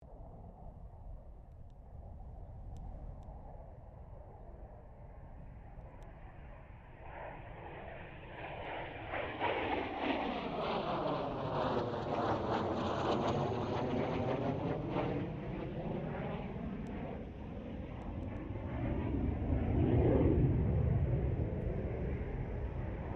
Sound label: AIRCRAFT CA-27 SABRE PASS STEREO; F-86 Sabre; Airplanes; Vehicles